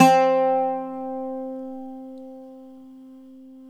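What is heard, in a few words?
bouzouki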